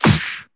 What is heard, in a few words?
Pre-Shifted Claps; Vengeance Samples